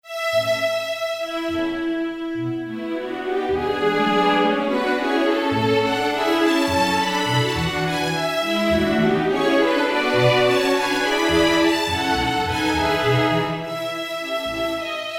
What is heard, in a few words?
string orchester